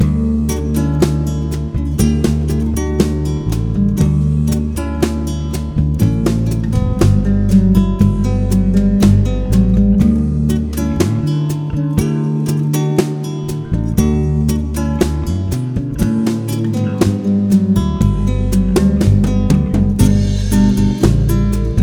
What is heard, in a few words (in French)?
Guitare n basse batterie